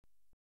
Inverse Gun (Single Shot), Electronically Generated Sounds; BBC Sound Effects Library